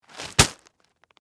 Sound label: Bag Rocks Down, Foley, Plastic, Set; BlastWave